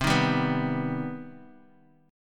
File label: C Minor Major 7th C Guitar 4F,4A,5C,5G,5C,6E; strummed; JGuitar